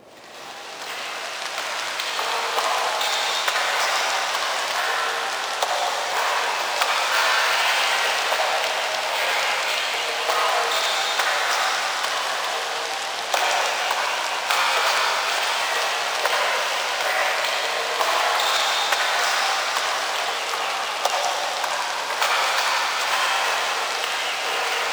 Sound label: Trap Ambience, Background Ambience